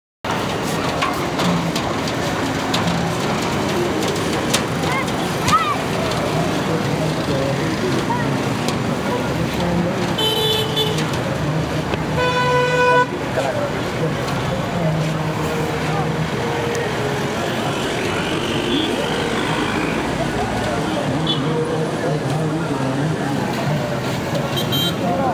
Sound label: walk, fieldrecording, Kathmandu, Nepal; Boudha, Kathmandu, Nepal; Putali Sadak, Bhaktapur Sadak, Khulla Murch Park